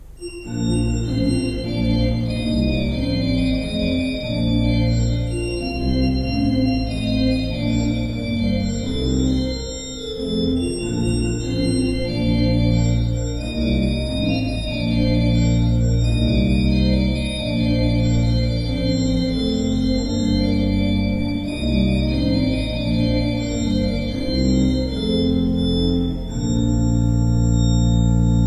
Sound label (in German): Breil, gebrauchtorgel